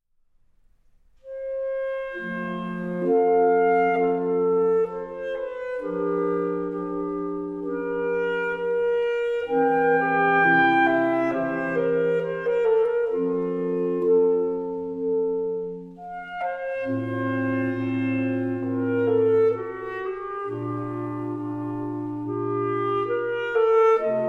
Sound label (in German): Adagio fuer Klarinettenquartett; Adagio für Klarinettenquartett KV 580a